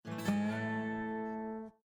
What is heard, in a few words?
Balloon; Windows 7; Delta